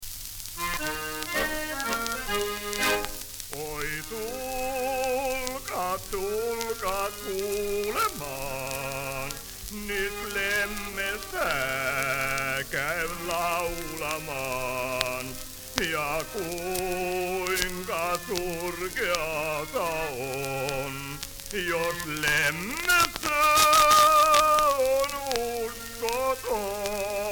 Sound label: Polyphon